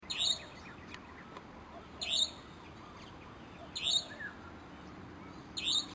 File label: Savannah Nightjar Caprimulgus affinis call; Amar